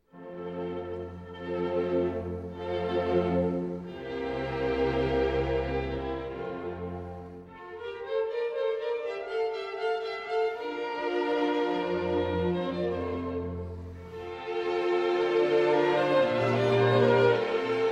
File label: Concerto For Flute, Harp & Orchestra in C Major, Andantino